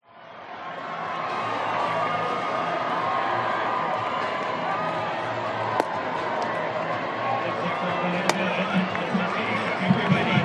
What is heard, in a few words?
american football match kick off crowd cheer drums announcer ref whistle